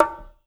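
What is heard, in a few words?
bongo; Spectrasonics; Liquid Grooves 1 & 2; CONGAS+BGOS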